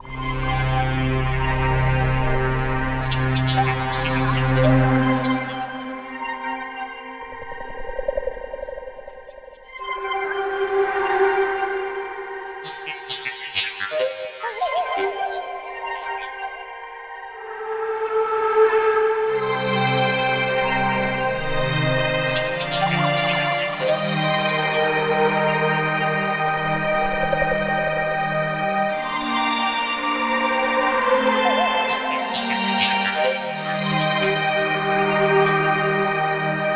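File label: ranch ambience loop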